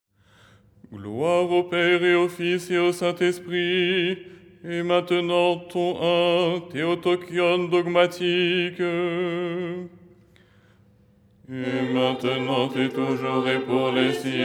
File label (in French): basse